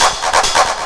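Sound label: HH clap loop, big beat; Big Beat Samples & More; AKAI; HHMIDFX KIT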